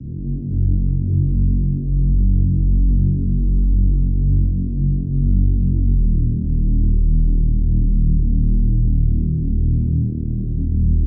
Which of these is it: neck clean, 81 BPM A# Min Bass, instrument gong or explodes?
81 BPM A# Min Bass